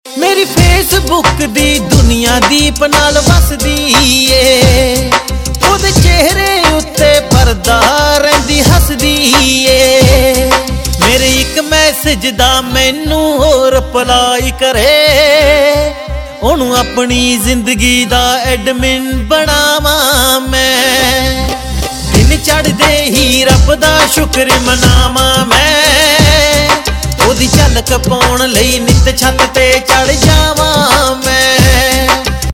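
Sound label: Ringtone, Punjabi Pop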